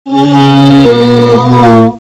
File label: funny friend chase; slender; zerosiki